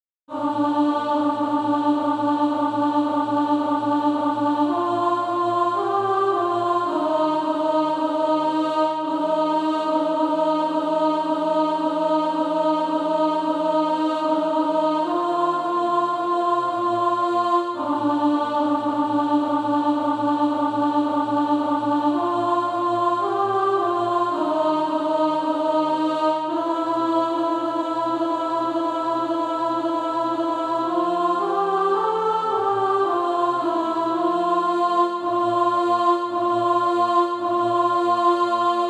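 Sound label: alto, SATB Practise Music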